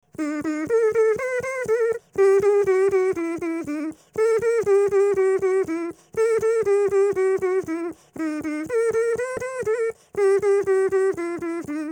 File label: an elf sings his abc s